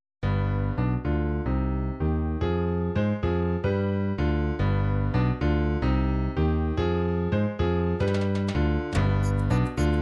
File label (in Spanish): salsa